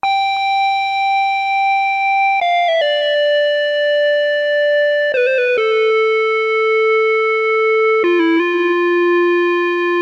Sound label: sad seventies